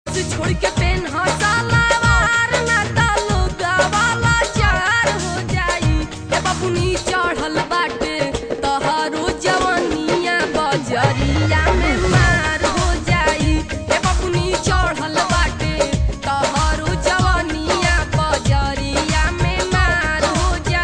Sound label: BHOJPURI RINGTONE